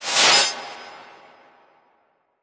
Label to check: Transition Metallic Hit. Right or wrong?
Right